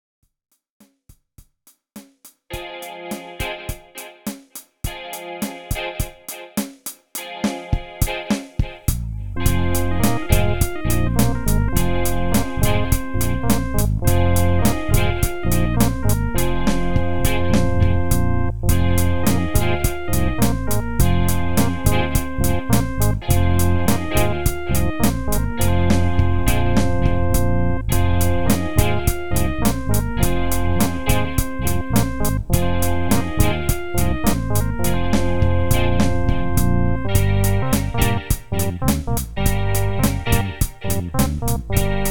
reggae; ecolezik session

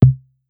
drop

